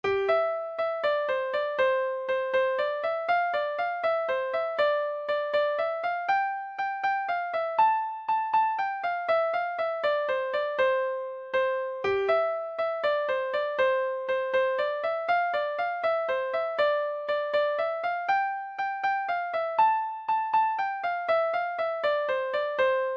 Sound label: quickstep clarinet primo